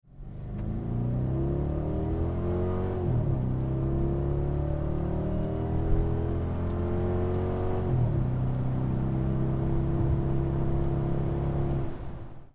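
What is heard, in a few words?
magnaflow incar, exhaust systems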